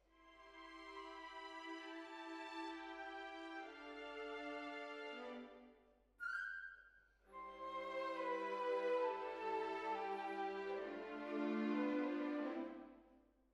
Hall

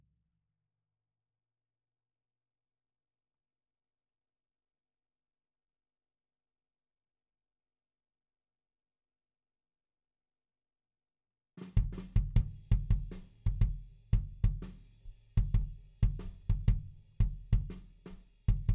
Kick IN

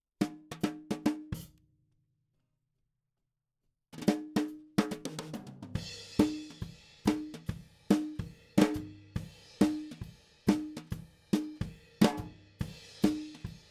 SNR top

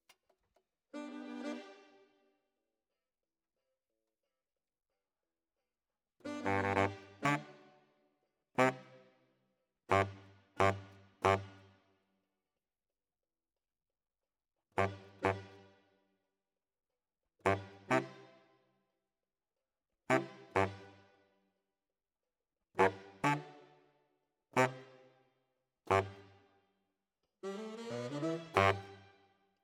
Bari, RNR for piano

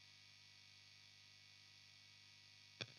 E. GTR AMP SOLO

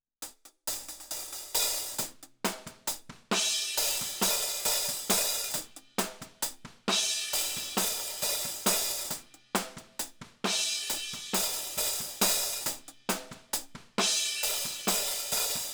Hi Hat

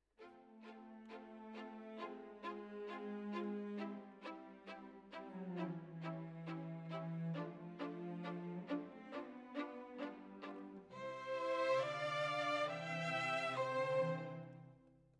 Violins, jazz for piano

